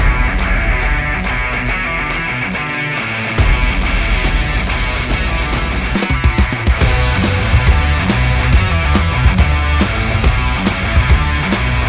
music

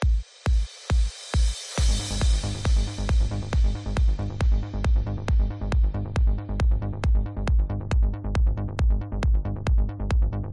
club music

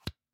undo

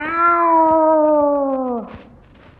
meows

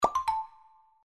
minimize

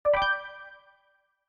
deposit detected

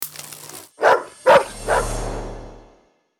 start

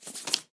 wood tile drop

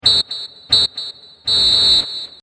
finale, stadium

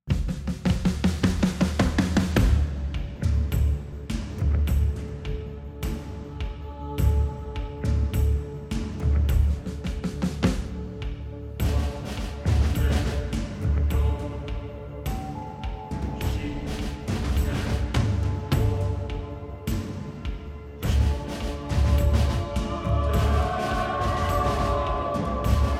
combat; Star Atlas

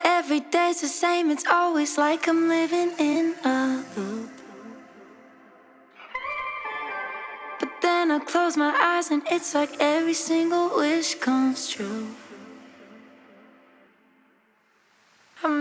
LEAD

